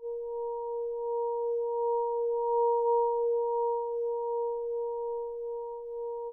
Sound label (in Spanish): campana loop